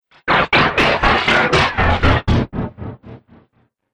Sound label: Science Fiction Laser Glitched; Sci-Fi Laser Sound Effect; Andrew Fleming